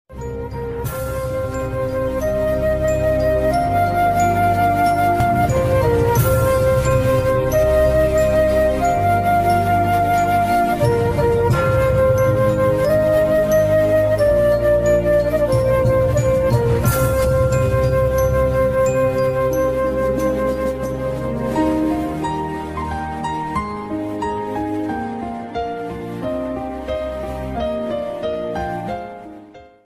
bgm ringtone best bgm ringtone climax bgm ringtone